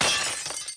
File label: GLASS